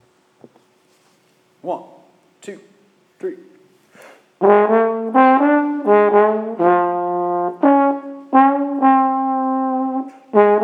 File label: bone swing